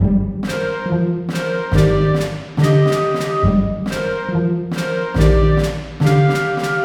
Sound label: Enemy Territory (Orchestral); Ultimate Everything Music Bundle; 12 Loops for All Occasions